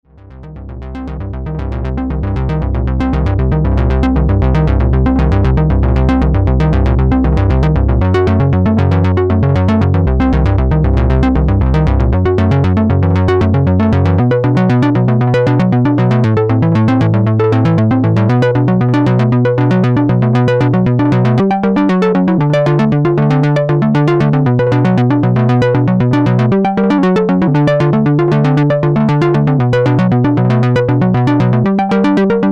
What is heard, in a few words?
Duophonic Sequence